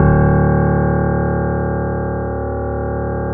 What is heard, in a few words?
D 0, Keyboards Acoustic, GRANDP ST; USB Soundscan vol.17